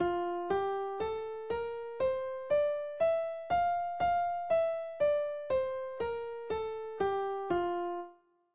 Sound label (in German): Tonleiter F Dur, klavier; S.-36-Tonleiter-F-Dur-1